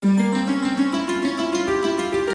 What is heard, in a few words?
Bonus Intro; Temple of Luxor